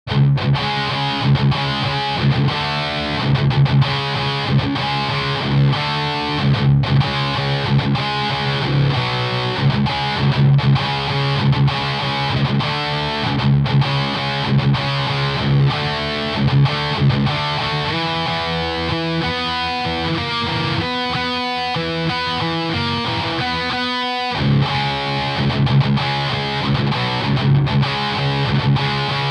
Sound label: chug u530 g12h55 sm58; overdriven